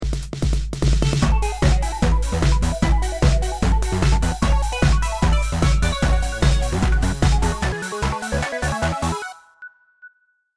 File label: round ten sec count, music